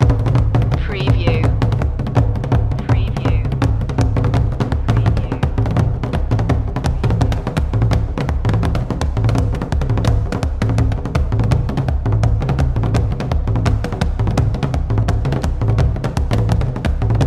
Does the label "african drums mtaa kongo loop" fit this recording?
Yes